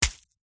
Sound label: horror gore impact fresh fleshy guts bloody punch stab